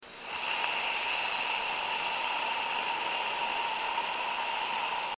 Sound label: EXHAUST AIR SOUND WITH AIR AMPLIFICATION